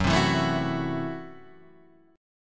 F sharp Minor 7th Flat 5th F sharp Guitar Standard; strummed; JGuitar